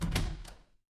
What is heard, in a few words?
door open